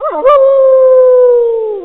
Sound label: Howl